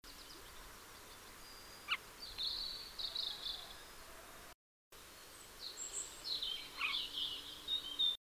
Passer domesticus; SETE

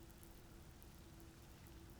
pulses, BALAENOPTERA; MONACO 2022-07

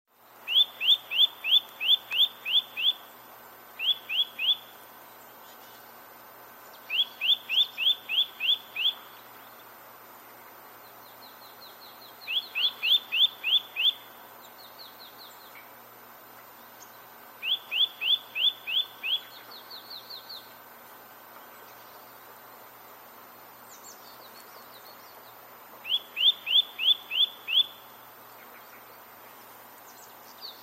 Sitta europaea; SETE

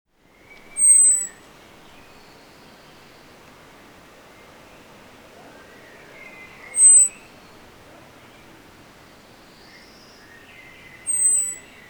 Turdus merula; SETE

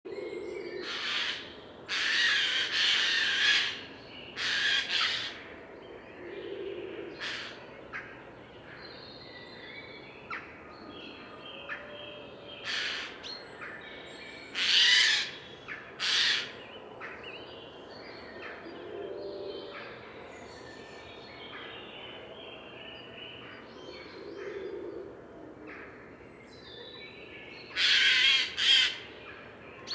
Garrulus glandarius; SETE

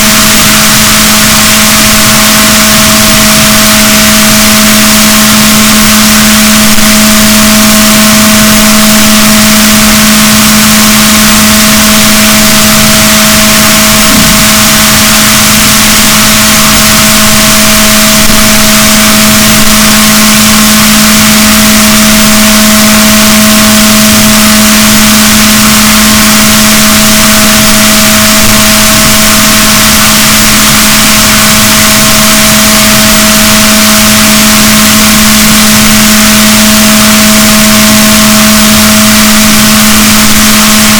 rorquals negatif, boat

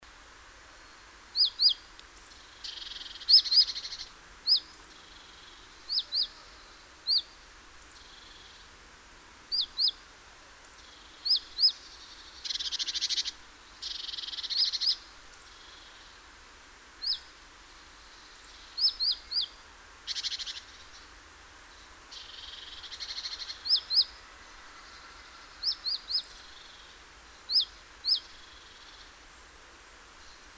Parus major; SETE

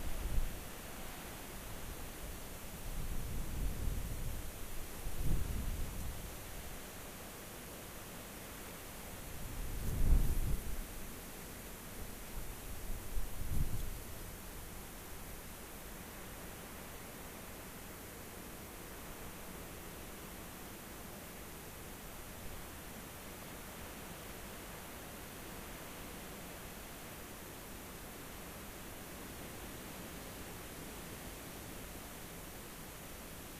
rayol farm; SEAMED

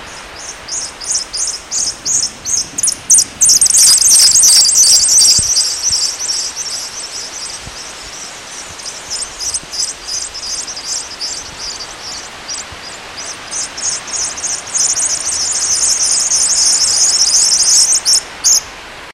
bird

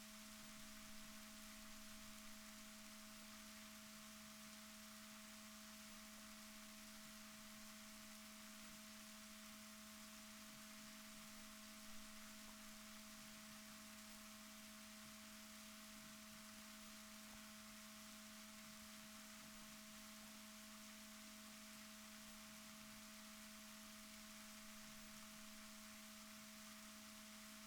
rorquals negatif, Balaenoptera Physalus Chile

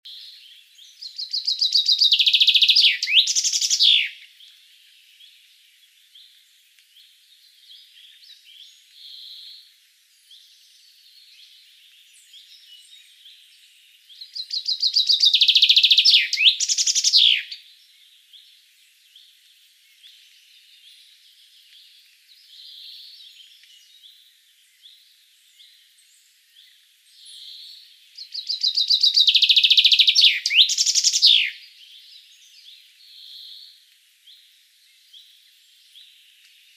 Fringilla coelebs; SETE

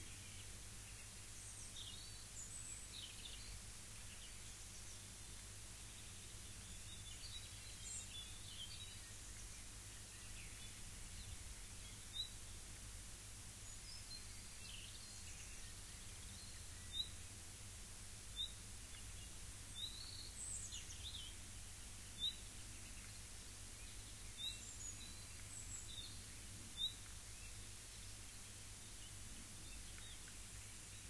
rayol farm; SEAMED

